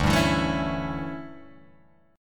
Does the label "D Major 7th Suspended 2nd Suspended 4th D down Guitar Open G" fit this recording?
Yes